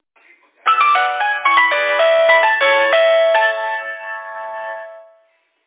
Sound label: nokia original music